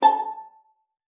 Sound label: A5, VIOLIN PIZZ; Xsample VOL-5